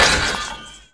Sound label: Ice Damage, Ice Blocks; fowl